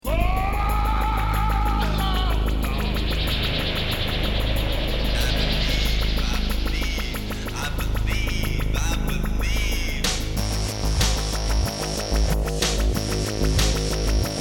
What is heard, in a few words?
trippy score